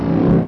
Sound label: wall hit